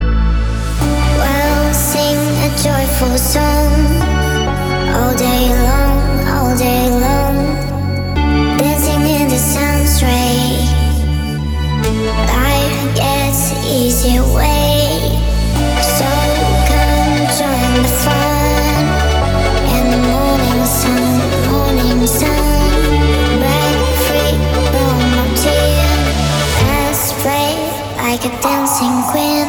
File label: speed up